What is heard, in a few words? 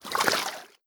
Water splash